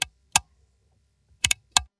mechanical switch flick

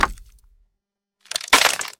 cracking wood impact

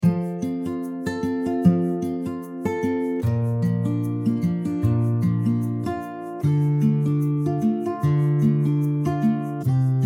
calm acoustic flow

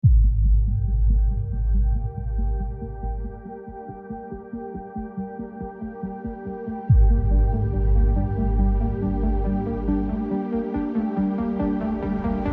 expansive synth journey

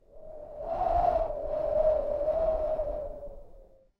whistling wind gust

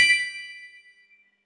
notification chime ding

